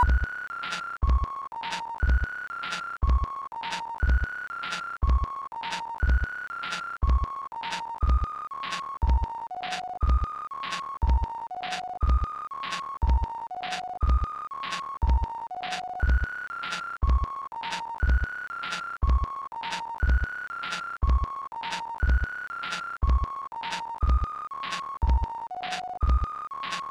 Sinewave

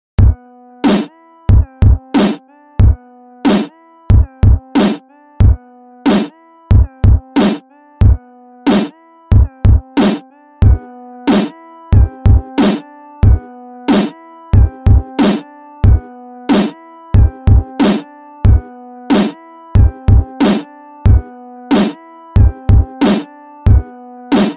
Sine Wave

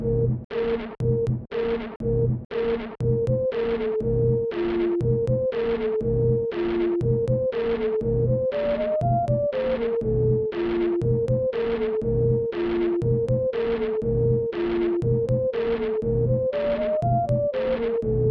Sinewave

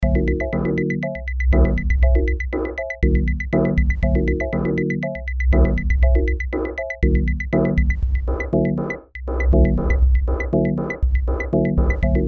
sine

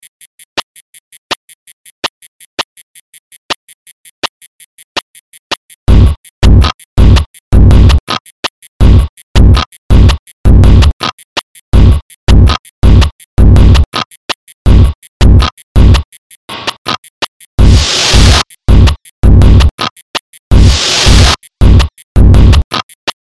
Sinewave

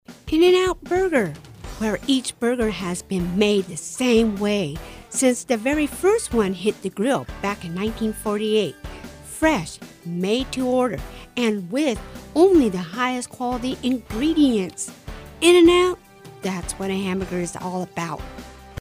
in out w music

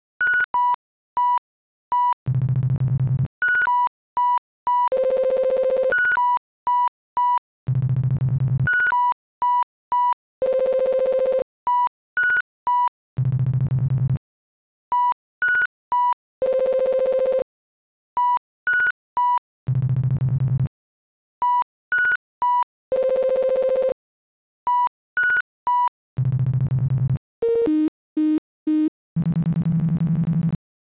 Sinewave

